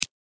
Pencil Break Snap Wood; Office; Blastwave

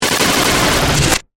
Glitch Electronic, Glitch, Electronic, Failure; Production Element, Imaging Element, Accent, Transition; Glitch Sound Effects

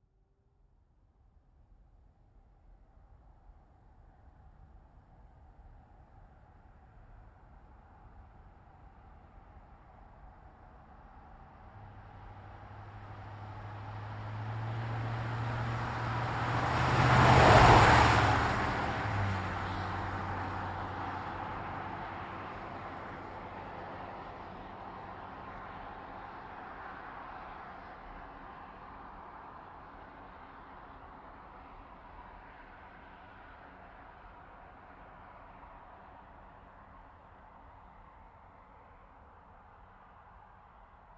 Truck GMC Savana By Med; 2010 GMC Savana; Trucks; Vehicles; Big Room Sound

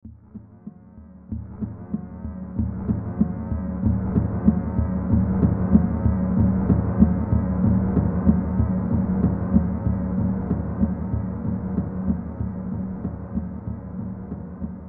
Bed Hits Slow Movement Creepy Reverb; Bed Sound Effects

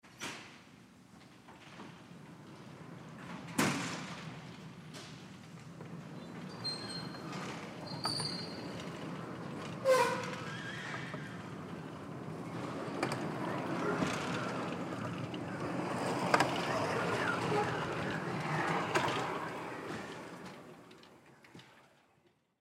Hangar Doors Open Distant; Transportation Aircraft; Airports

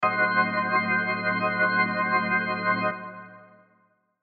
Organ Stab, Organ Stab Dissonant Soap Opera; Keyboard Sound Effects

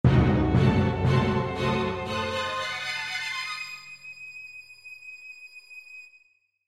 Crescendo Ascend Strings Dramatic; Musical Sound Design; Blastwave

